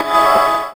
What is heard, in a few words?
A Syn, Chords Tones; Equipped Music; Loungin House